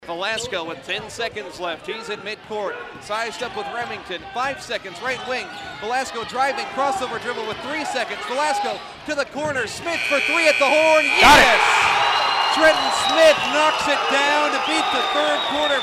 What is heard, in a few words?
beats buzzer